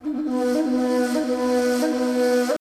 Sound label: SIERRA FLU